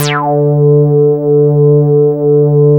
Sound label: SOURCE D4, SOURCESYN